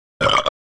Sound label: Belch Wet & Short Close Perspective, Human Sounds